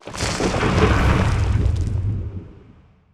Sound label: lithoid moving out transport; Stellaris; lithoids